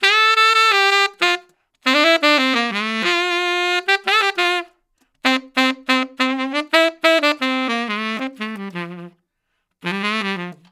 Tenor One Shot, tenor sax melody; Sonic Foundry; Jazz Solos and Sections; Traditional

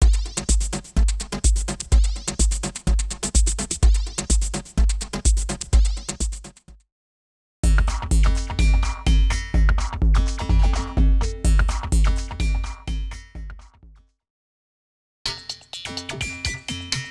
Electronico 126bpm; X-Static Goldmine 5

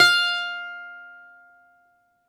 BALALAIKA, Russian Strings

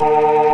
B3 E 1, ORGAN; VOCAL ORGAN; ORGAN #4

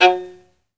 Synclavier Strings, SOLO VI; ILIO

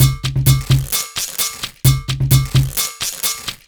Beat (130BPM), household rhythm

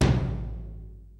PHAT TRAX KIT, DRUMS; Kid Nepro; Elite

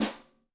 Snare, Yamaha DTXpress-II

